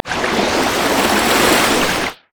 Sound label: loop; LoL; syndra